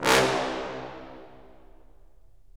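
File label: brass cimb, Roland; Roland LA Composer vol. 4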